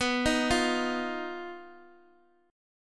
B Major Flat 5th, Guitar, Open D

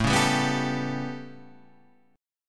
A 9th, down

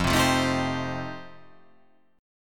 F 9th F, chord

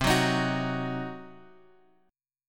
C Diminished C; Cdim Chord {X 3 4 X 4 2} strummed

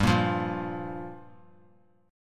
G Minor 7th; Gm7 strummed; JGuitar

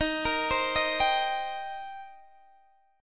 D sharp 6th, D sharp, down, Guitar, DADGAD

